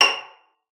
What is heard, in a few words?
PIZZ, VIOLIN; Xsample VOL-5; 90 s Sample CDs; VIOLIN FING